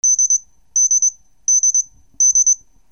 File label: BUZZERS